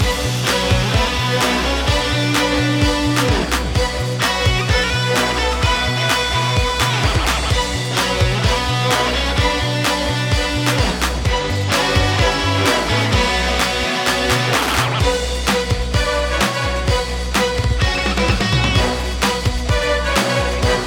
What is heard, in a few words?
menu; Power Rangers vs Teenage Mutant Ninja Turtles - Ultimate Hero Clash 2